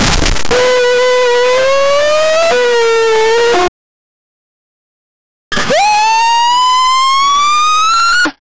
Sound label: the sound of magic, ambiance